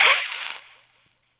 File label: ghost witch voice hiss; Infernal Radiation